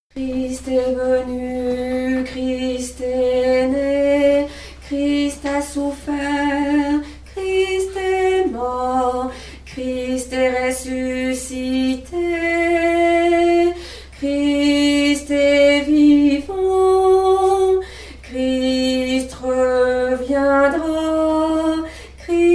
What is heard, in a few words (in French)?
Christ est venu, Basse